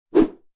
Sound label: flappy wing